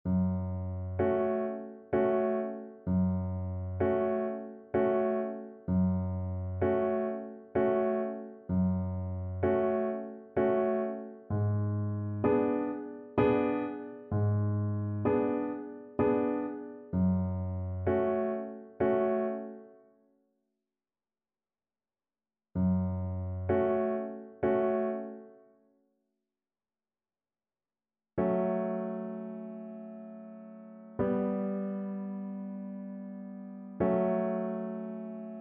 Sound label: creak crunch scream, Violin; Slow Playalong